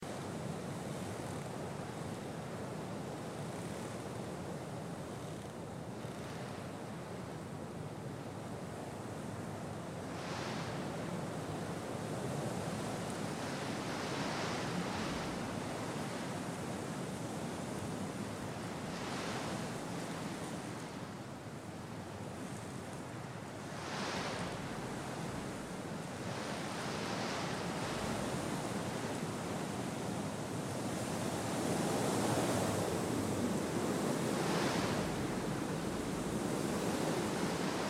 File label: ambiance treetop; Otems Defiance